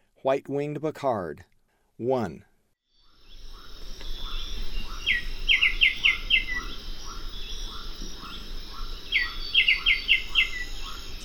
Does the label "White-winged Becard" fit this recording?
Yes